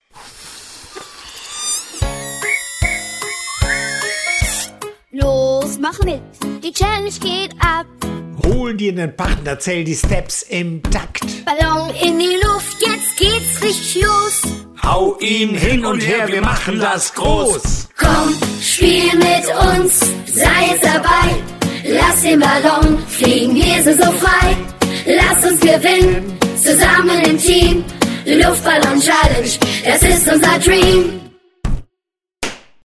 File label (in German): Luftballon Challenge Song UKE